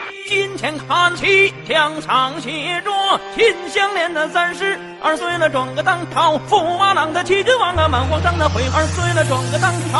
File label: chinese rapping